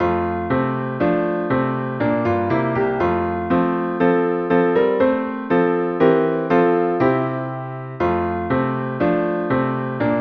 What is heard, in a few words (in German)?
Klavier